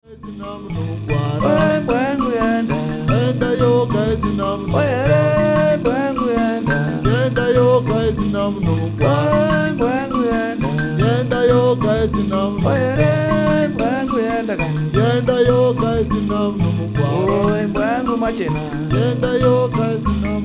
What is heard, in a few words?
mbira